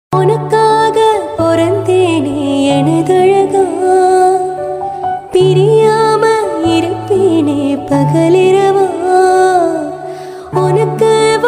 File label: tamil song